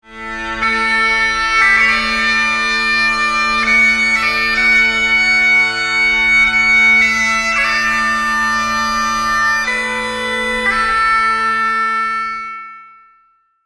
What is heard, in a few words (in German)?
dudelsack ringtone